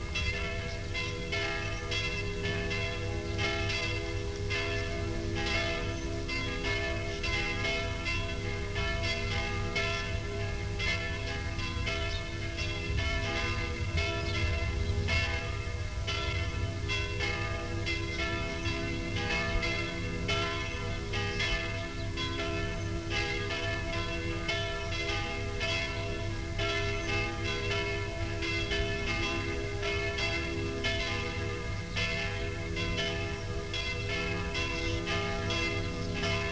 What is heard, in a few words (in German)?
Glocken; Brenndorf